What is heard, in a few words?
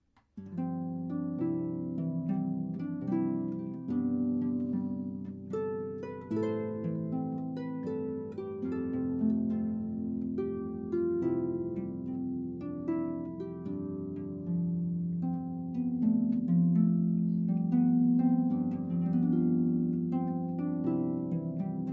Hymne